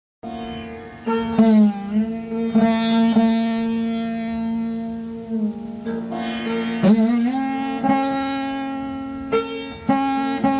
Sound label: Raga sad